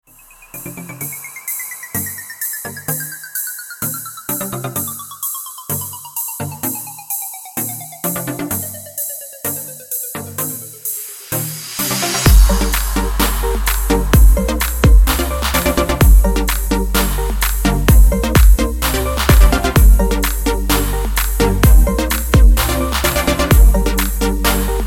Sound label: dj music